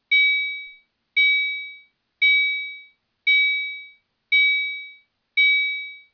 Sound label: CHIME